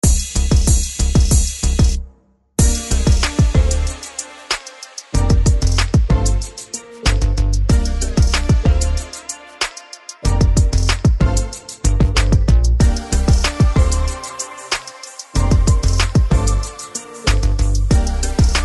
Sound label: urban